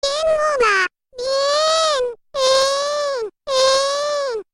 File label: crying